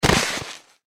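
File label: Body Falls gravel; SFX Body Falls Grus; Granny 3; Android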